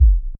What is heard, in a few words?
Soft Kick, percussion